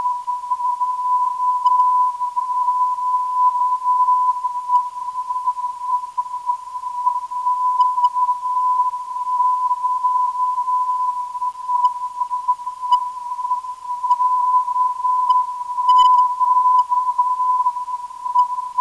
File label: 968.82kHz, NDB